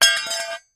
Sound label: Bracket Drop On Cemen